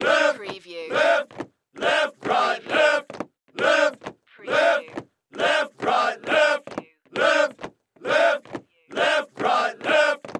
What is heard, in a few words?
Soldiers March Chant